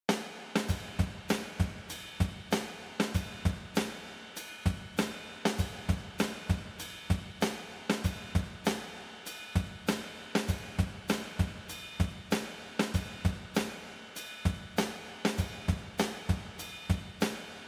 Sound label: Eventide SP2016 70ties Kit SP2016 ST Room Vintage